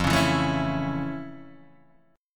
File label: F Augmented Major 7th F Guitar DADGAD